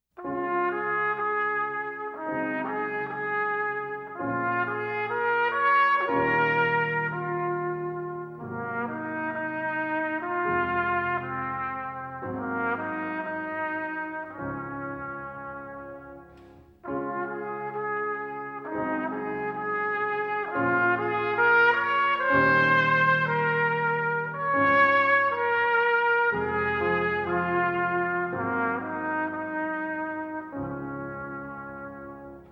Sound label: performance, Trumpet; Canadian Brass Easy Solos